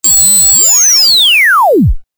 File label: TUNING; device sounds